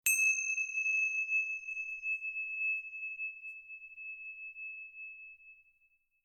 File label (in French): Cloche Tibet son; Percu